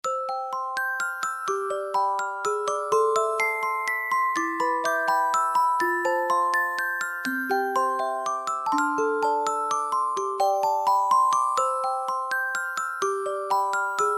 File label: musicbox